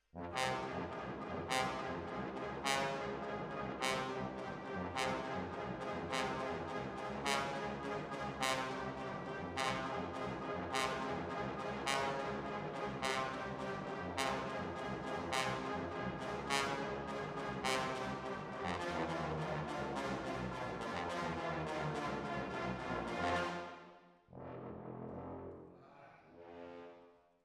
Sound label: Hall, Jazz for piano